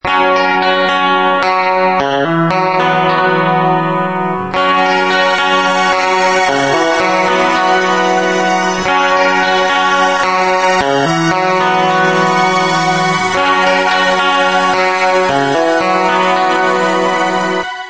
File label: accord